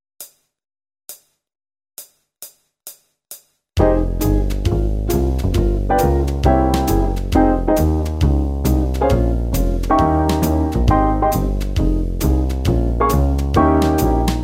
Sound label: Jazz; MyVirtualBand